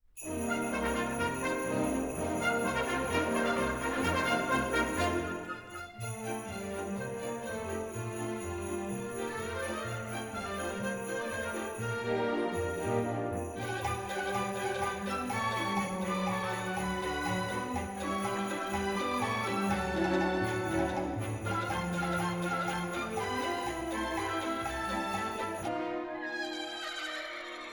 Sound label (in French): Noel en Choeur; Concert